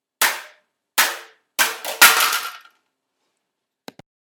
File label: Saw Falling